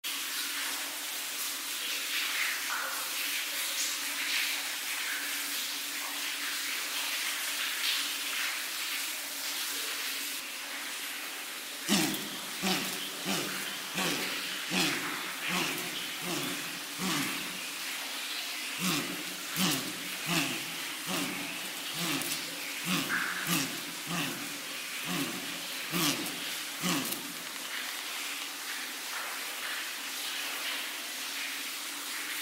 Western crowned pigeon; کبوتر تاج آبی

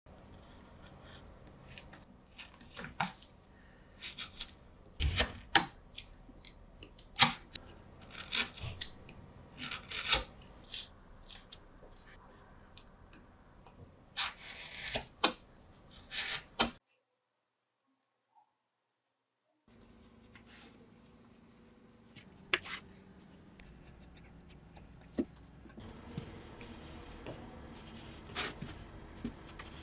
Mosaic; معرق کاری

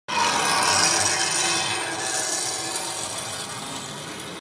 nails on wall; ناخن کشیدن روی دیوار

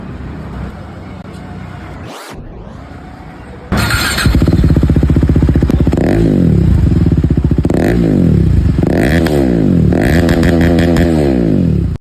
KTM, اگزوز

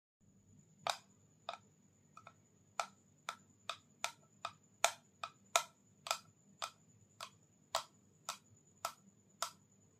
Nail on box; ناخن روی جعبه

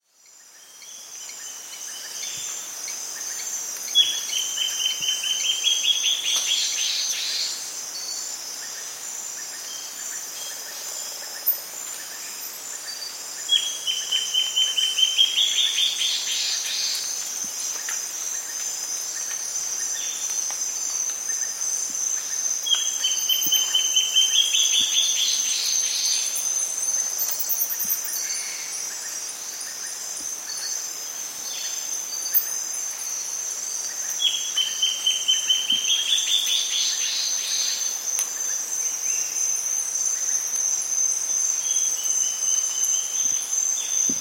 Guianan warbling antbird

